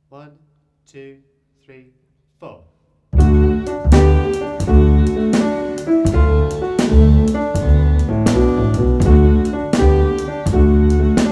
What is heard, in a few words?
Cattle Ranch Blues